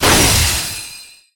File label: summoner skill requiem; MUDevs S20.1-3